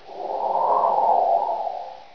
squall; ambience

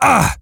pain; player

